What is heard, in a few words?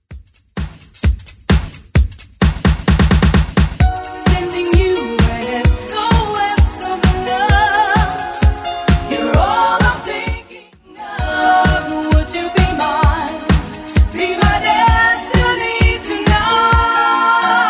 90s Redrum 130bpm